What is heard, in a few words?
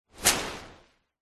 foley tree leaves branch whoosh impact designed